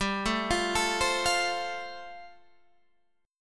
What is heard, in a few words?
G 7th Suspended 2nd Suspended 4th G, Guitar Standard; G7sus2sus4 arpeggiated